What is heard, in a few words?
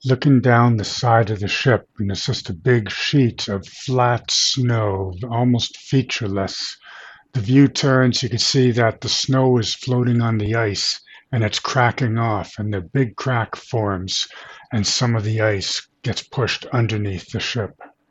Ship pushing into soft sea ice; antarctica; Description Ship pushing into soft sea ice